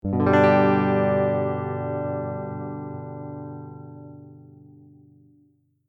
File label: Electric guitar G major single soft strum